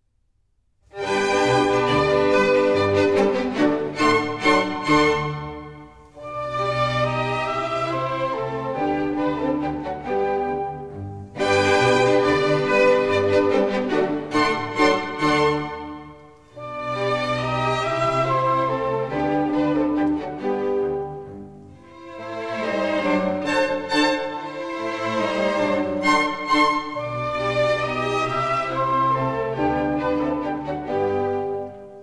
Minuets, G major; Mozart; Dances and Marches

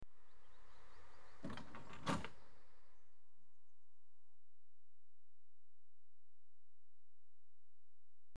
Points Change, Age Of Steam; BBC Sound Effects Library

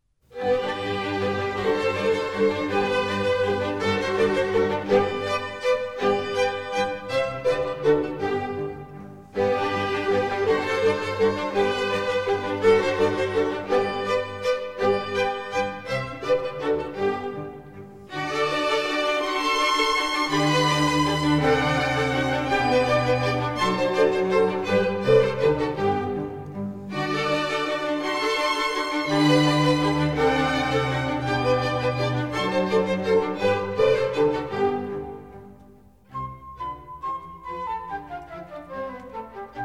Minuets, G Major; Mozart; Dances and Marches

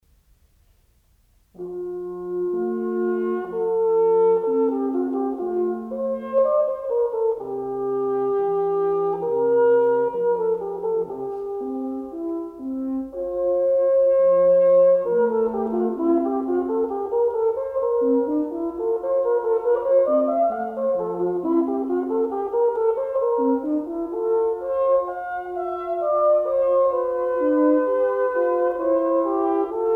Duos - Andante, Wind; Three (of 12) Duos; Mozart; Serenades and Divertimenti for Wind

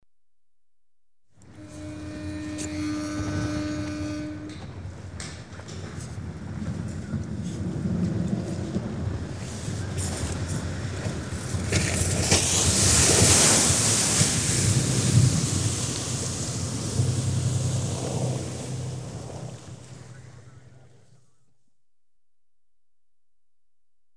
Lifeboat, launch (from shore), Ships & Boats; The BBC Sound Effects Library

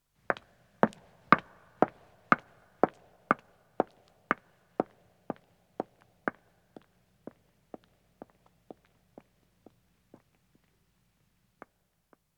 Stone Walking, 1 Woman Departs, footsteps; BBC Sound Effects Library

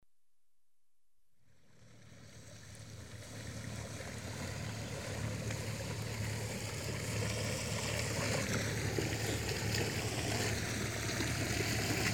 approach and stop, Ships & Boats; The BBC Sound Effects Library

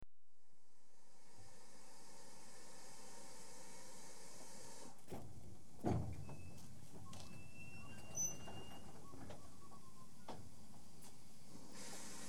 Train Departs R-L; BBC Sound Effects Library; Age Of Steam

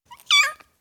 Ten Week Old Kitten, Individual, Cats; BBC Sound Effects Library

